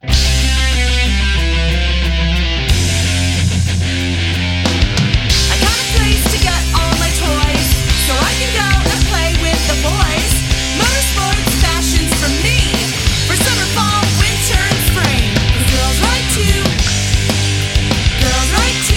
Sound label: jingle